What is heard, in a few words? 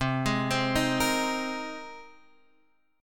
C Minor 7th Sharp 5th C down Guitar Standard; Cm7#5 arpeggiated; JGuitar